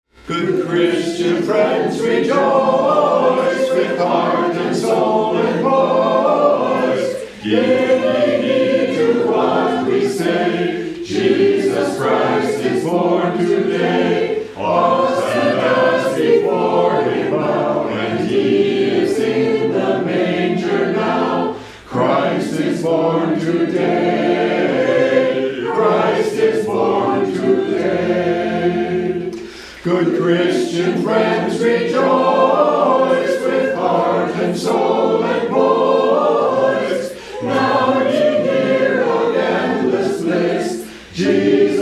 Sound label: Hymn; Good Christian Friends, Rejoice